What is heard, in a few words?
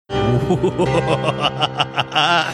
evil laugh